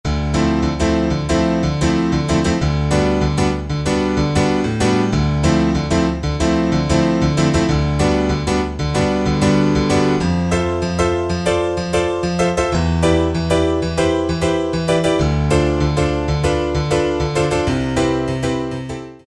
Yamaha TG500 AP Dance

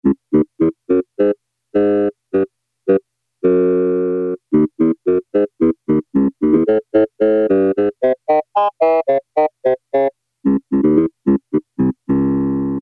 BASSOON, Yamaha DX7

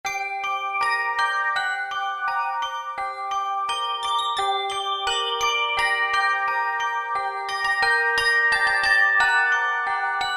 Music Toybox, Roland PN-D50-04

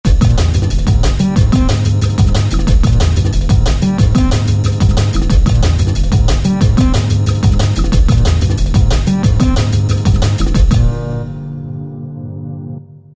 Mad Walking Bass; Korg ES-1; Factory Patterns

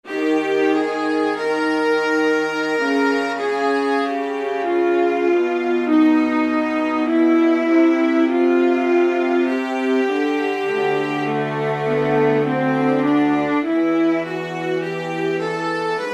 STR Vas Bright; Roland L-CDX-04 Strings And Orchestral Percussion

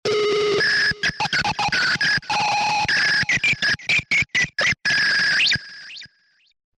RESO Nz Lead, Roland V-Synth; Factory Patches

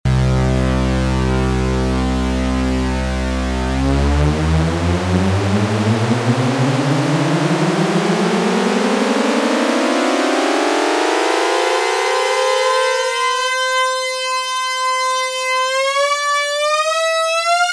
UNISON PORTAMENTO, Oberheim OB-8; Factory Patches

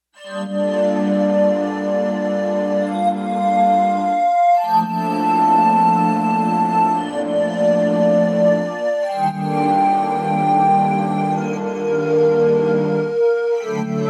Bell Flute; Digital Synthesizer

